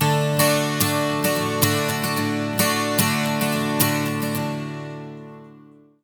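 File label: 12 string, Acoustic Guitar Loops; Maj; USB Soundscan vol.04; AKAI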